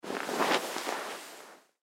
Beanbag Chair, Beanbag Chair Get Up; Living Room; Household; Blastwave

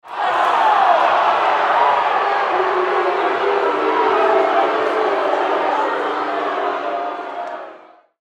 Crowd Arena Angry, Crowd Arena Angry Boo; Crowds (Sound Effects:Backgrounds); SPORTS; SoundEffects-Blastwave

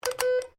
MORSE CODE A; Morse code aplphabete; Morse Code (Sound Effects:Electronics)

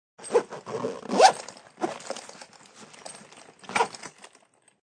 Zipping Luggage, Zipper on luggage; Zippers; Objects

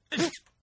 Sneeze; Sneezing; Human; Partners In Rhyme

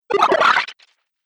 Arcade Game Retro Gloop; Video Game Sound Effects; Retro Inspired; Nintendo, Atari, C64

